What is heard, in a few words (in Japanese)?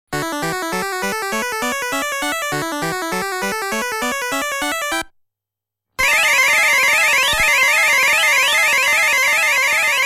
オープニング ／ メインテーマ ／ ジグソー完成